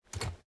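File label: footstep armor; smite; kuzenbo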